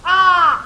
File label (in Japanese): karasu